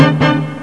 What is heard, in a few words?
tutut